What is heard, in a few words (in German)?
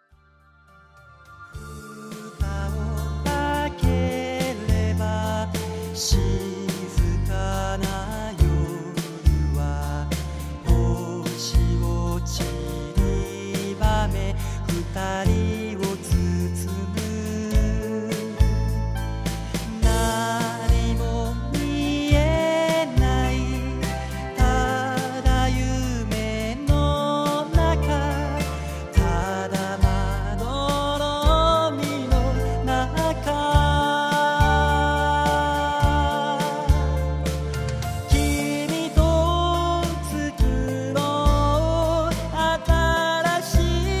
orgel